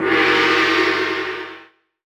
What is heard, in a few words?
gong; HeavenStudio; munchy Monk